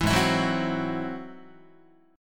G sharp Augmented 7th G sharp Guitar; G#+7 strummed; 7,4,7,4,4,5; JGuitar

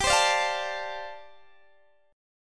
G 7th Suspended 2nd Suspended 4th, Guitar DADGAD

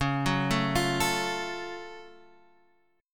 Gb Minor Major 7th Db

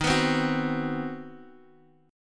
C Minor Major 7th Flat 5th D sharp, chord

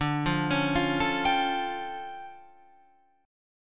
D Minor Major 11th down Guitar Open F; DmM11 arpeggiated; JGuitar

